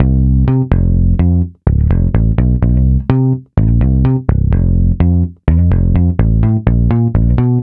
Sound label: Root C 126BPM; Vengeance Samples; VEH3 Special Sounds